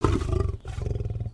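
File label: Sabertooth speak; creatures; pets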